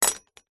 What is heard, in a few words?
industrial tool metal spanner or similar set down; zapsplat